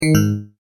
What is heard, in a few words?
multimedia tone error; Tones; Sound Design